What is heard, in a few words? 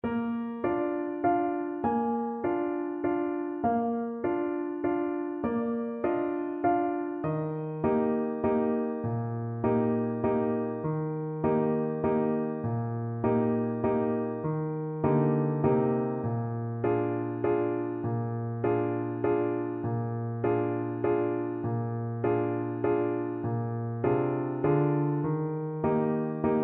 down in the valley trumpet